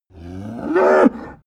cow moo single short

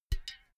filled can hit dripping

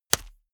small punch hard

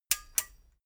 metal button stereo click enable slow

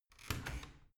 washing machine open

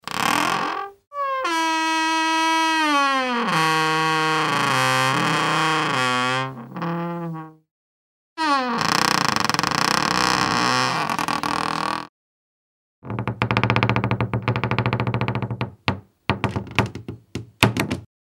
door squeal

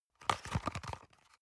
paper box small handle hard

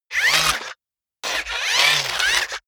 robot movement sequence

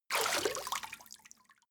step water run out

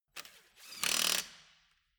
impact wrench screw fast single

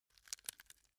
bamboo small break soft

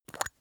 glass bottle open

